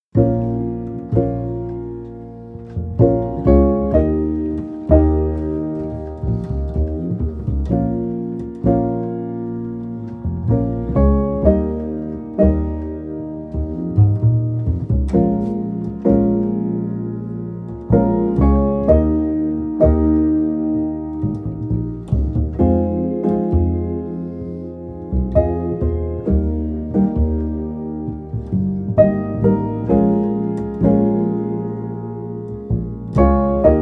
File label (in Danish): Akkordraekke